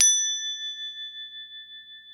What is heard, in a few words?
CROTALE A5, Crotales